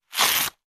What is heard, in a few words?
Rip of sticky label